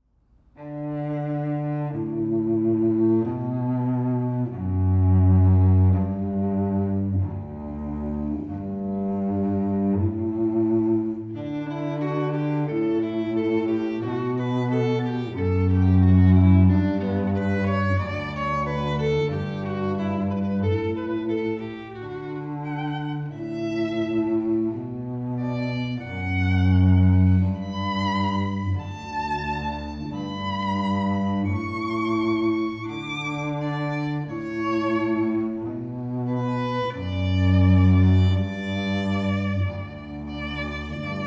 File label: String Duo